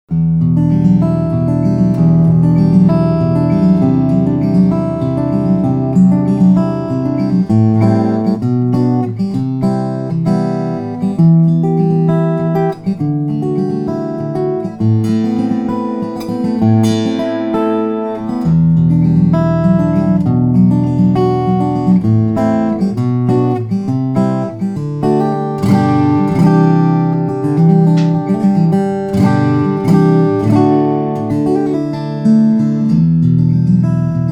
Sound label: steel string guitars, flattop; rainsong aple al petteway limited edition